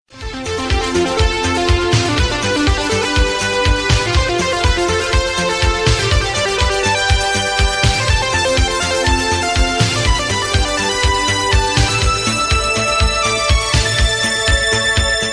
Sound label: digital dream